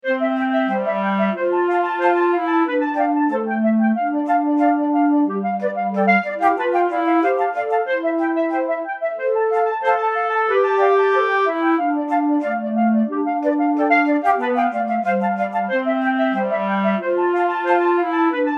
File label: bassoon